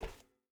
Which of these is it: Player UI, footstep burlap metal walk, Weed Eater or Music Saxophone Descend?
footstep burlap metal walk